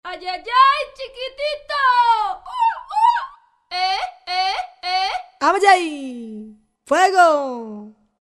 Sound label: Voice; World; Latin; Roland SR-JV80-18 World Collection Latin